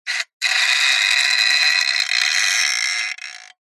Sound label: metal stress creak thin tone; zapsplat